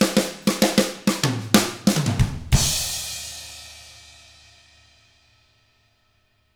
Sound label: HARD ROCK, Drums; ILIO; Double Platinum Drums 1